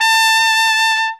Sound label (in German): SOLI TROMPETEN, Alpine Volksmusik